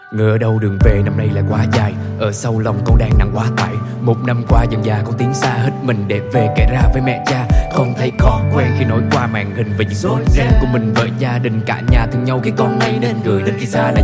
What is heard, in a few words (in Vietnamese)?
Nhac Chuong Rap; nhac tre